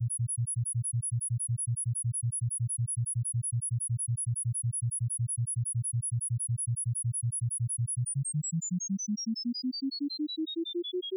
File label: mirror music LOOP GAPLESSLY